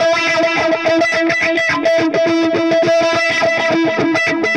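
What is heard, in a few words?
Delay Wah, Guitar; Sonic Foundry (Sony Creative Software); Indie Rock Anthems; Session 02 - Clinical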